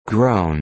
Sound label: groan